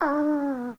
Kitten Snore; Warframe; Catbrow

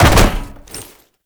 Land Heavy Perforated Metal; Movement; Warframe; Uriel

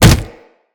Generic Hit; Tenno

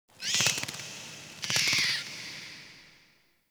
Interior Cave Bat; Warframe; Duviri; Ambience

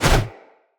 Husk Whoosh, Unarmed

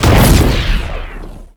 Shred Explosion; Warframe; Temple

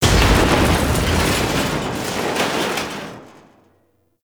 Military Structure Collapse; Warframe; Ambience; Nineteen Ninety Nine

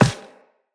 Walk Metal, Footsteps; Operator

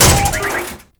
Loot Locker Open; Warframe; Storage Container

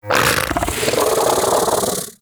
Bug Vocal Mount; Hunting Critters; Inf K Drive Bug

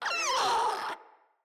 Infested Crawler Hear Sound, enemies; Crawlers

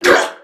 Pain Crewmen; Dialog; Barks; Battle; Warframe; CorpusCrewmen; CrewmanB

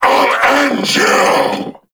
Vocal Player Spotted; Warframe; Duviri; Dax

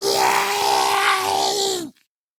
Attack Roar Grineer Ghoul; Grineer Ghoul Expired; Battle Barks

